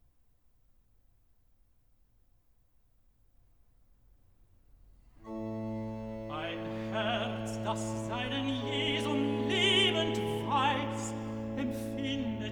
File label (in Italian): Recitativo Tenor Alt; Bach; Cantatas; BWV 134; Ein Herz das seinen Jesum lebend wei